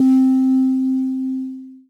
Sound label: C Synth, One Shots